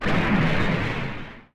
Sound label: Monster Explode